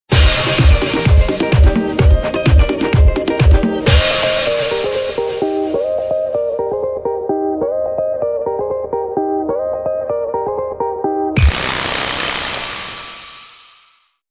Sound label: upbeat intro; sounddogs